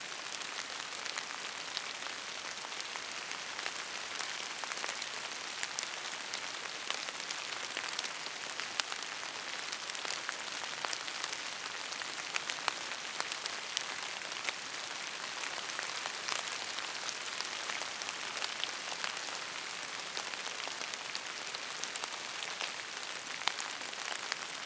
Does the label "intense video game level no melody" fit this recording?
No